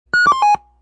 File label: beep; noisetoys